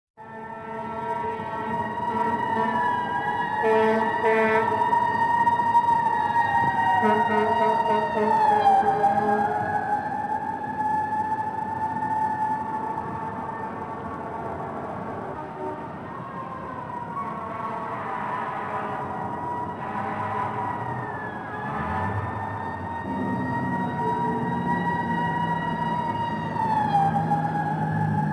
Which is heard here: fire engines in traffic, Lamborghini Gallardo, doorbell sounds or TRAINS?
fire engines in traffic